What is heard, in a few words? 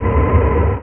Small Wind; SimEarth Demo; Artificial Life